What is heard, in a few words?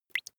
dripping single